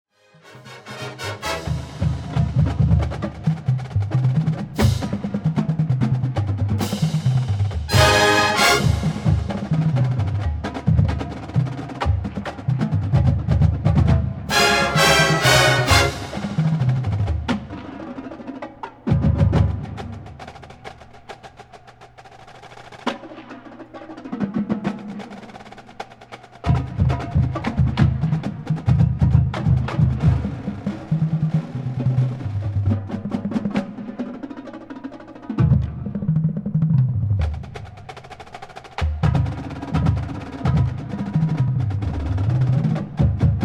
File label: Drum Feature, marching band